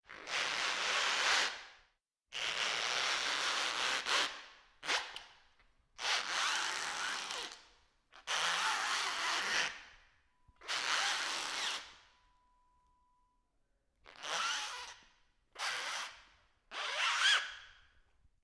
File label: rubber shoe squeal exaggerated